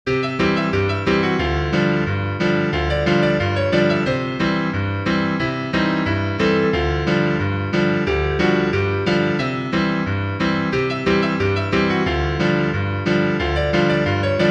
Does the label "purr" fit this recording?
No